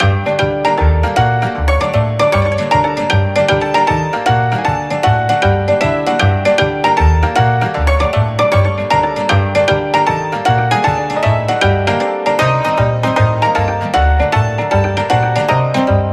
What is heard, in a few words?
Balkans Music